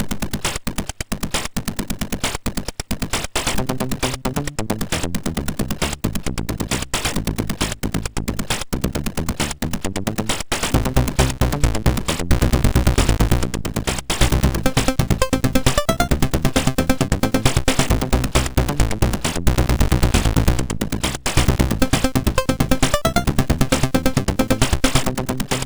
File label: Intro, MOS6581R4; AFL Intro